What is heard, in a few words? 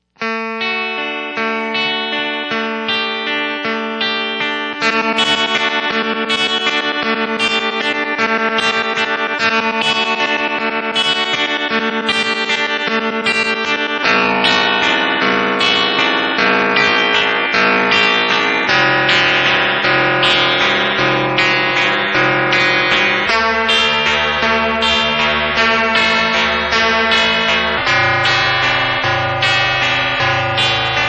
ring